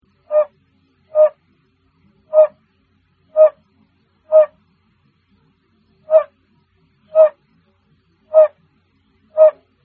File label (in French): chant bombina